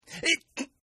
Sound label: Sneeze; Sneezing; Human; Partners In Rhyme